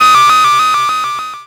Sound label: Animation Electronic